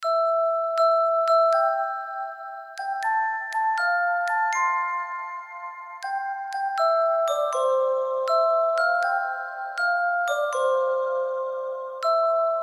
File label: generated handbeat